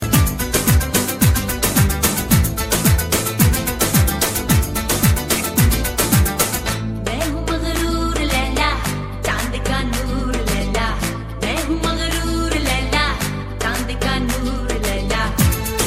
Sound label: pakistani song ringtone